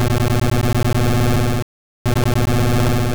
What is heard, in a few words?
Self Destruct